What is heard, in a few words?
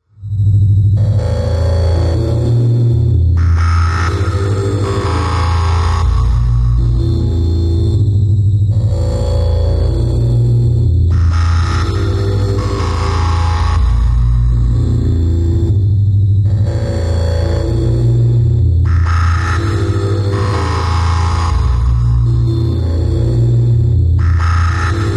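Static, energy beams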